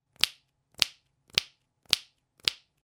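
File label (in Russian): щелчок пальцами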